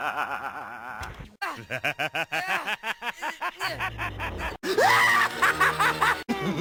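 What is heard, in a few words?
joker laugh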